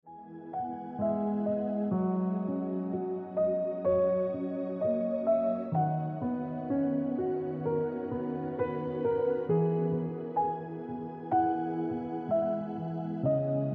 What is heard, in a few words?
Piano Music